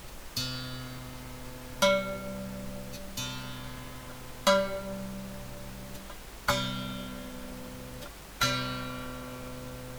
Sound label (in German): quinte, musik